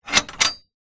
household gate bolt movement

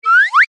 cartoon slide whistle ascend climb very fast

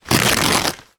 wrapping paper rip; foley; zapsplat

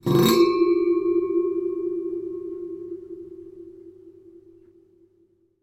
metal scrape bars radiator resonate; foley

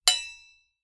household silverware impact hit

